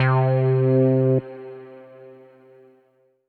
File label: Synth C, Synth Prophet One Shots; Electronisounds